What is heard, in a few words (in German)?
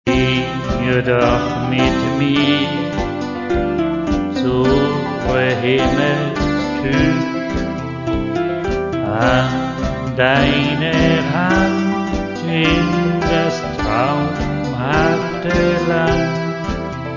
carillon